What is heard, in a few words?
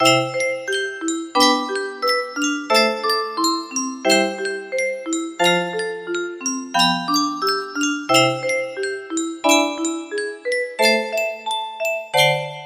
nes music box, music box melody; zelda 2